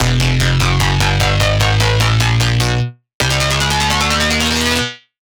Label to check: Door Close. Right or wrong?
Wrong